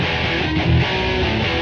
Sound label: 146 metal guitar; GUITAR LOOPS